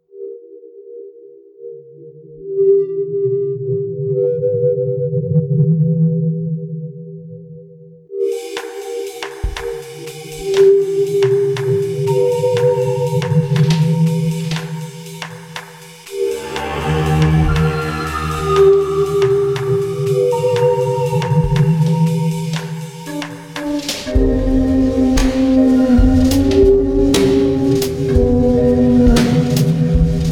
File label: Slow Tango